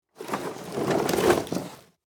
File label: Wood pile movement